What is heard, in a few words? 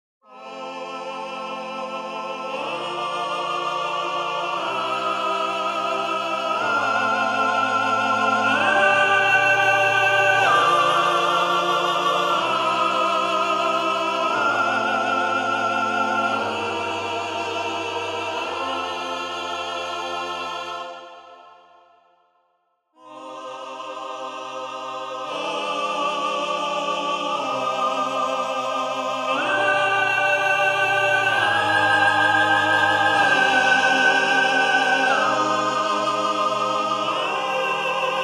counterpoint